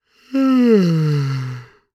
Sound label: Human Male Yawns Short